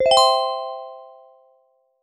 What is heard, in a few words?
level